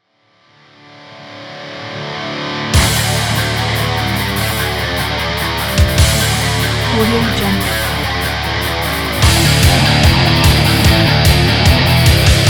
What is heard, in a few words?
metalcore